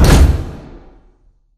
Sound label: pod door sm verb close stop; Titanfall 2